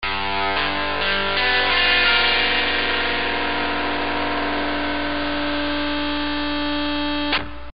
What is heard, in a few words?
Electric Guitar